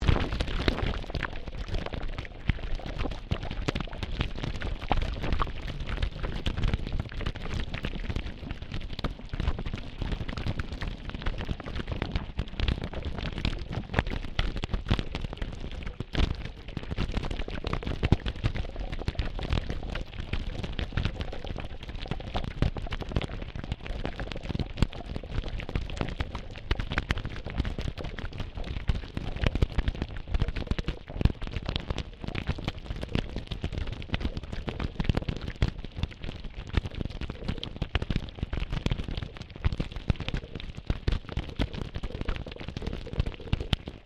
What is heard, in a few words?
Water Wateflow Submerged, Waves recorded underwater on Queensland's Sunshine coast